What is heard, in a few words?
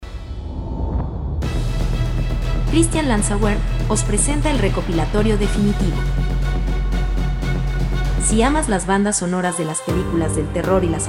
trailer infernal music